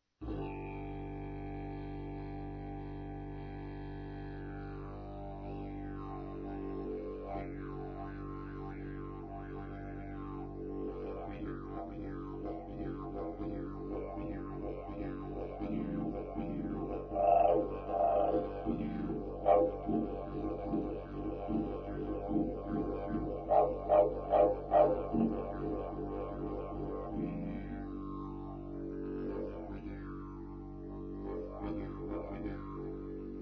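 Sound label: pvc G, didge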